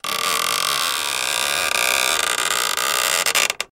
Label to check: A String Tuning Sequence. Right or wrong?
Wrong